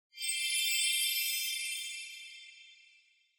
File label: Onoma Sparkle Timbre2 Short